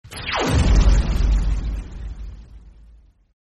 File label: big laser meme sound